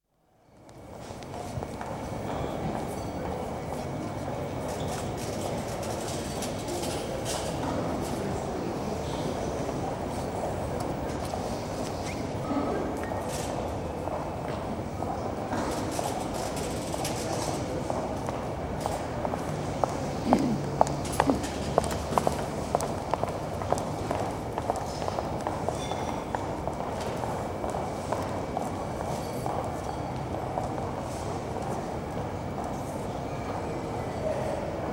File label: City Airport atmosphere on concourse in arrivals, departures terminal building, without PA announcements; Airports; BBC